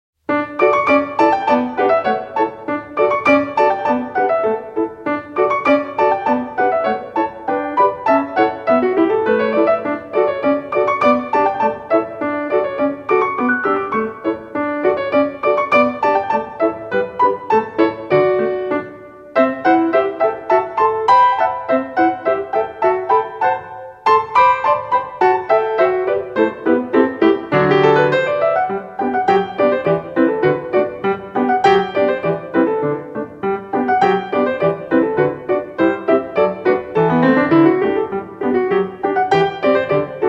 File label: Springtime Dance Piano